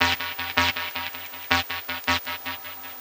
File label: 80 Fm, Melodic Loops; M8; Electronisounds